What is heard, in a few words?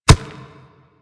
Step Dirt, Footsteps; Warframe; Kubrow